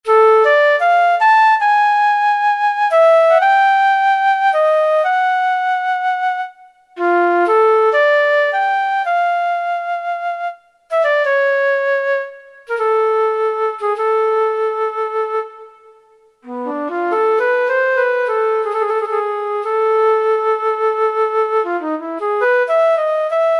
Solo Flute, Kurzweil K2000; Kurzweil K2000 V3

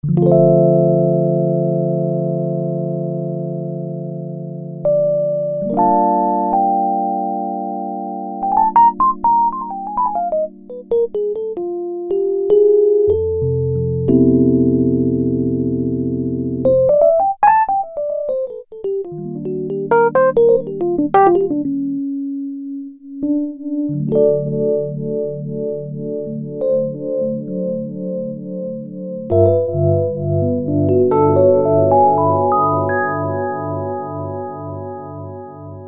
PNO-Rhodes Mk.V; Keyboard Instruments; Roland L-CDX-02 Keyboard Instruments

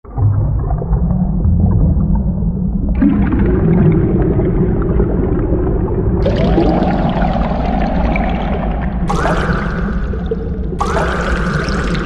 UnderWater; Special FX; Roland SR-JV80-15 Special FX Collection

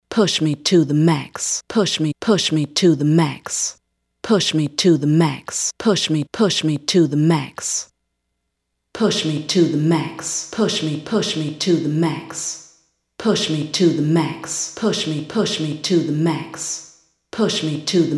PCM 60 Room, Lexicon MPX 1; Factory Programs

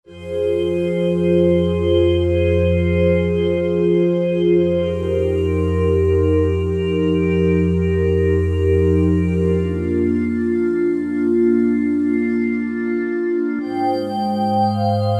Glass Voices, Roland JD-990; Factory Patches

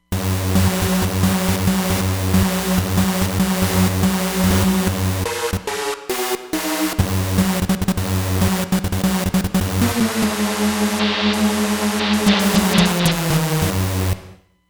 RAVtune, Roland Fantom XR

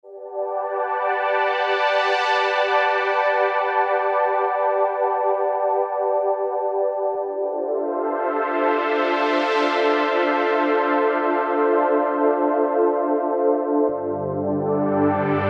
Roland JP-8000; Factory Performances; Stargate

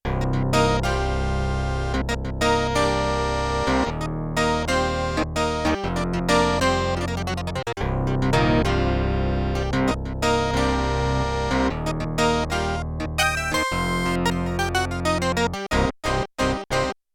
Clav, Yamaha TX16W; General Performance

